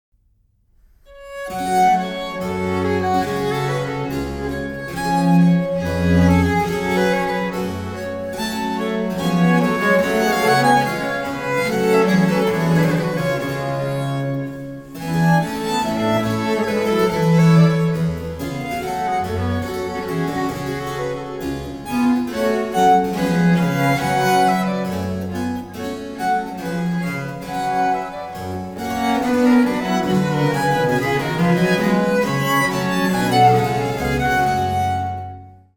Concerto a 5. Violino Principale Violino Primo Violino secondo Alto Viola e Basso; Allegro